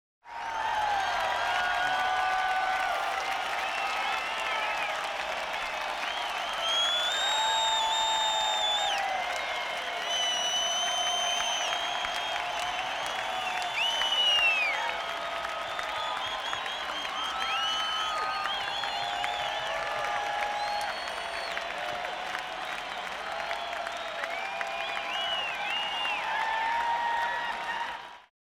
Crowd of 20 000 People with Whistles and Steady Applause; The Hollywood Edge